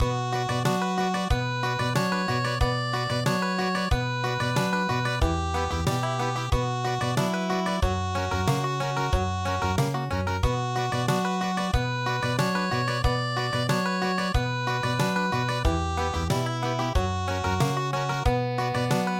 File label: Country, midi; Ruined Castle Moon